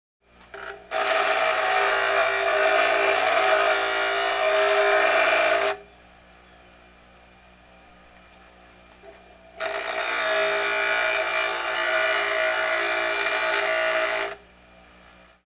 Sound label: grinding